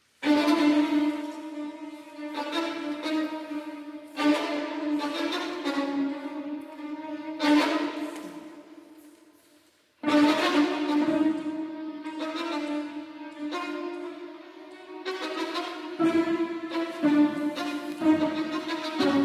Cello Concerto, Live; II. Éruptions

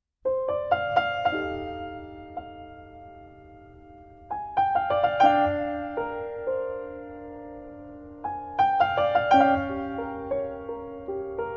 Solo Piano Version

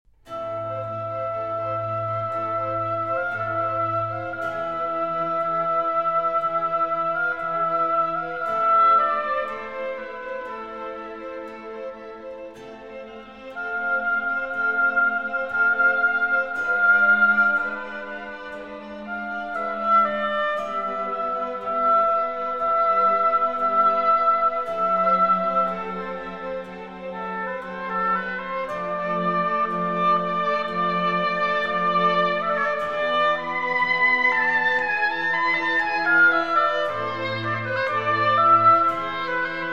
Oboe Concerto in C Major, Adagio

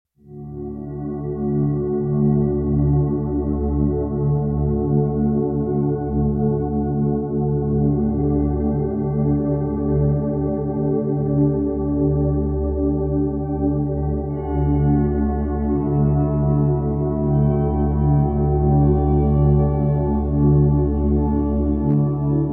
Binaural Sine Waves

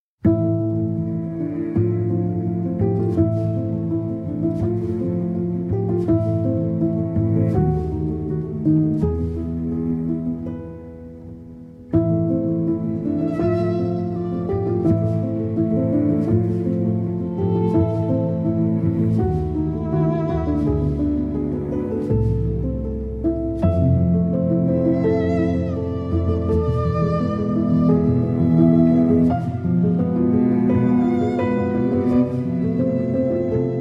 Waltz in A Minor, for Piano, Violin, Cello; Op. 34, No. 2

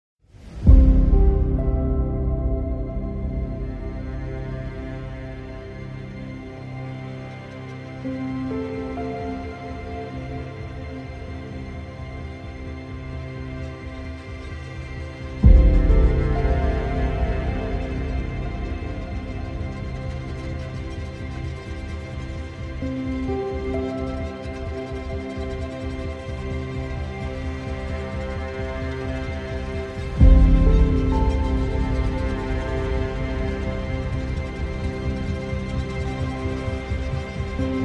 Prelude in C Major; Prelude and Fugue No. 1; BWV 846

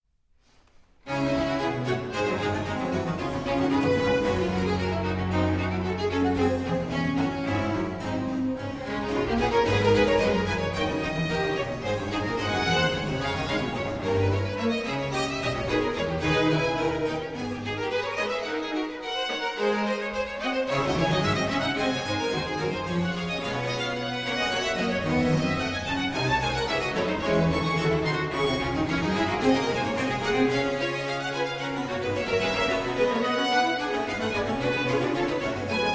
Concerto for 2 Violins in D Minor, Vivace; BWV 1043

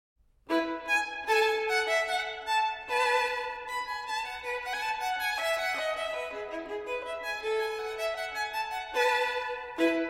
Violin Partita in E Major, Gavotte en rondeau; No. 3; BWV 1006 III.

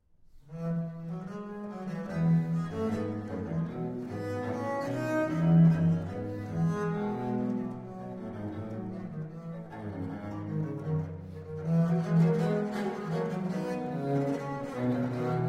Ayre For Two Bass Viols

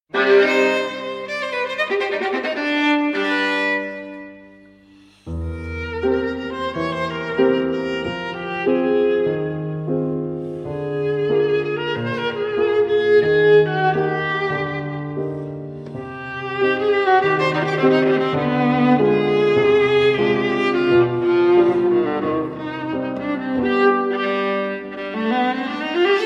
Sonata for Viola and Piano in C Minor, Allegro; Movement 1.

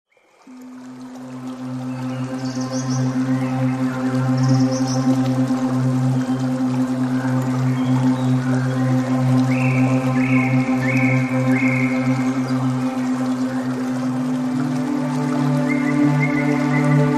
Delta Meditation 216 Hz

